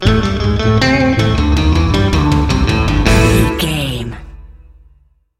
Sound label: nose dive stinger